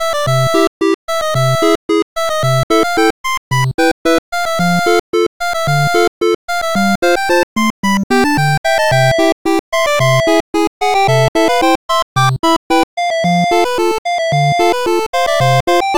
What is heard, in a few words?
8bit city Loop